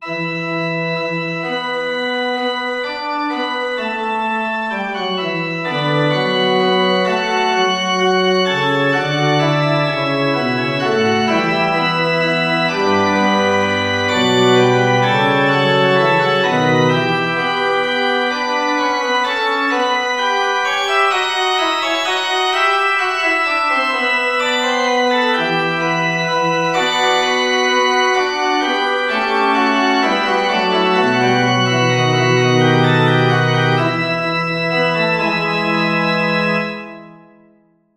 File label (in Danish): forspil